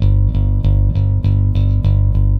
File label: BASS, ROCK POP; MAGIX